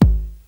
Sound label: THE 909 KICK; Crypton - DrumBase5000; DANCE KIT #3